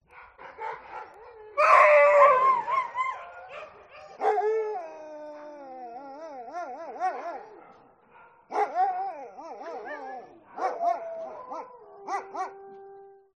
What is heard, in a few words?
Dog Siberian Husky; audio hero